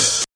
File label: hat; Hats